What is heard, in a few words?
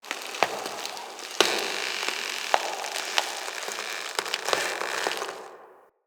Root Cave Root Creak; Ambience; Neathuns Root Cave